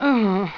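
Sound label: GRUNT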